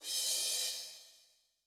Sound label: Soft Roll, Cymbal, Yamaha DTXpress-II